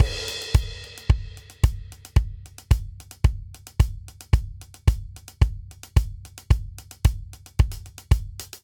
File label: Classic Disco Intro 111 bpm; 4 4; MIDI Clips; Ableton; Factory Packs; Drum Booth